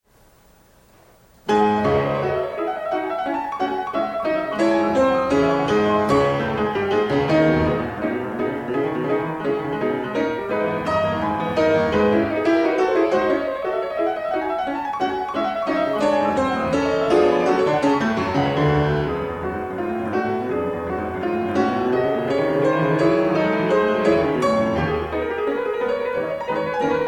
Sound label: Chopin Etude Op10 No4 in C sharp Minor, Piano